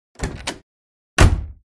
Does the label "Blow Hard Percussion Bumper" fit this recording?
No